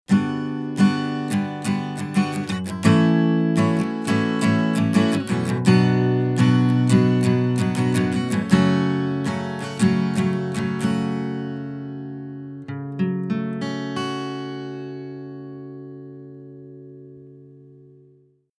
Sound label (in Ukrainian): gitara boj; Lewitt Connect 6 - Гитара Бой; аудиоинтерфейс; Lewitt Connect 6 — аудиоинтерфейс за 300$, с возможностью записи в двуканальном режиме